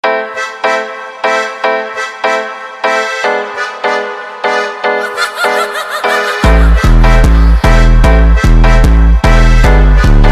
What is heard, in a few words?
FUNK